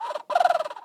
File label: Woodwind-Pigeon